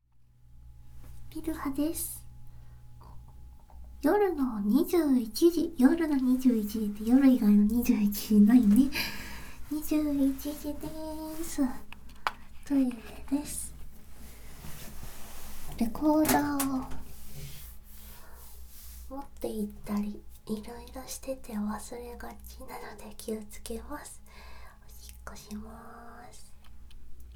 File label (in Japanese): おしっこです！